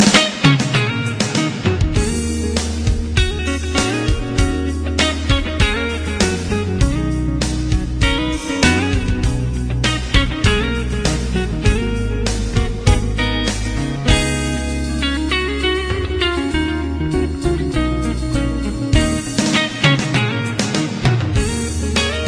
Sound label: guitartastic